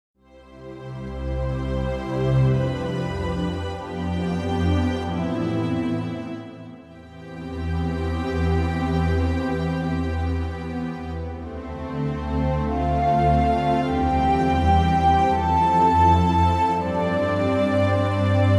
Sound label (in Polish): wersja wokalna; Teczowa Muzyka